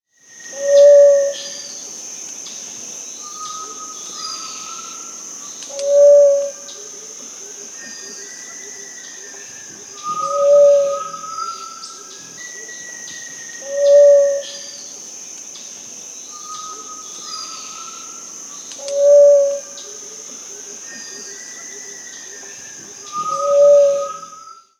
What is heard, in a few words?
Gray-fronted Dove (Leptotila rufaxilla), Peru; S. America